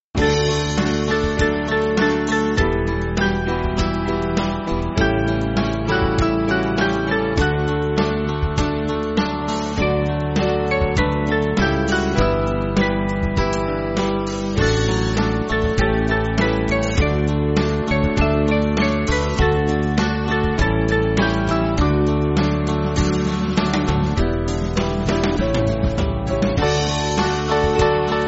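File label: Band, Music Backing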